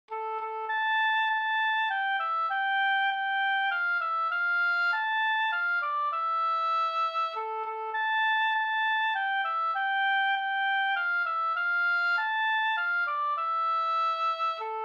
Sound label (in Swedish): folkdans; Bjorndans fr Hedemora-Sater